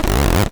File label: Acid Sounds G#, Synths; Vengeance Samples